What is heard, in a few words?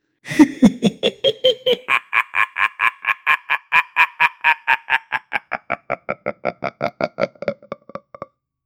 a menacing haunting clown